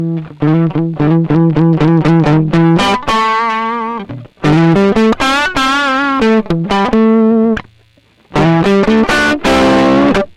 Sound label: Neck Pickup Blues Drive